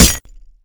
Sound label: ice break; sentry